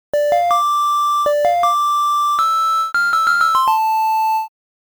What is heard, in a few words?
Ocarina; 8bit of Zelda -Ocarina of Time